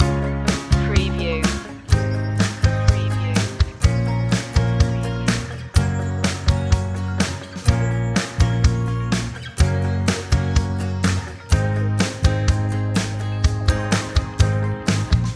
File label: loop